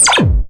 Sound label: ZIP, SCI FI; AKAI Anime Sound FX